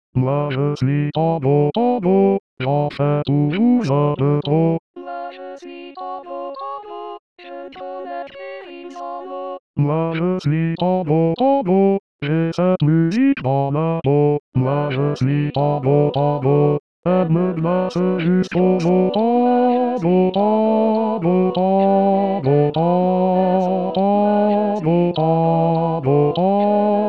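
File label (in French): tango basse voix accompagnement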